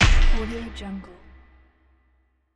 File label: metal impact